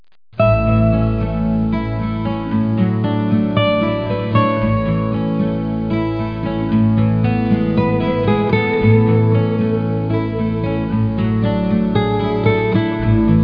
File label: Folk Guitars